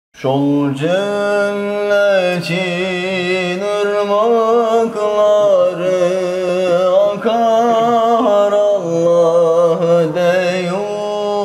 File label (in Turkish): ilahi; cennetin irmaklari